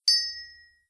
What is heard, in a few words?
ui Item